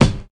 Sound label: bright bass drum one shot c key